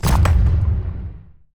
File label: WEAPONS Flak Gun